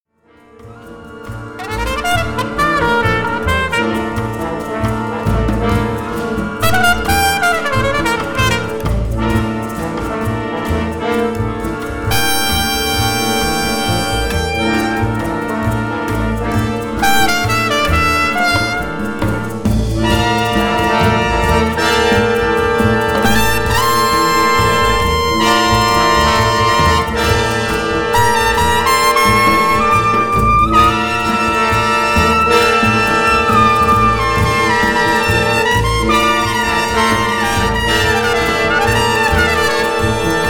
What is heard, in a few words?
Solea